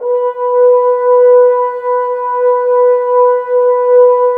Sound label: Brass F.Hrns; Sample CDs; Miroslav Vitous; Woodwind Brass Ensemble